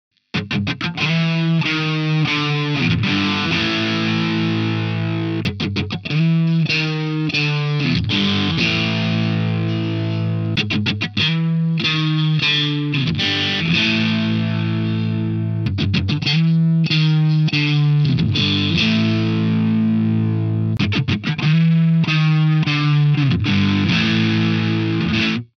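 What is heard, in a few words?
overdrive Fender Musicmaster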